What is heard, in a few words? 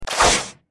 Game Complete Hide; Blockman Go; Android